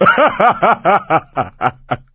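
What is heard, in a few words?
laughter, male; Left 4 Dead 2; coach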